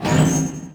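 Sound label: school locker; Tony Hawk's Project 8; XBOX; shops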